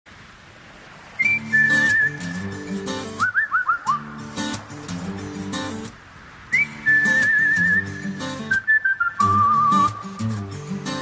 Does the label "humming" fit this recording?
Yes